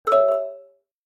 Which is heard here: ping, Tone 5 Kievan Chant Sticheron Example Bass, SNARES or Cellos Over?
ping